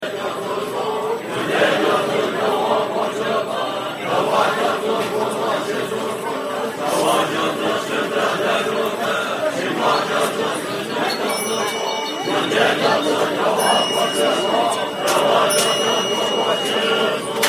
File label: Jokhang Monks